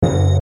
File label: expand; games; Book Of Lords